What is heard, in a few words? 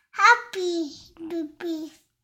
happy baby